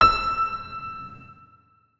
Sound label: notes, keyboard piano; Hideout Furniture